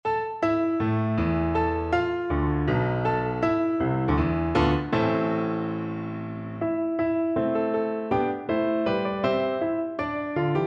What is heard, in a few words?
high germany FL kar, Flute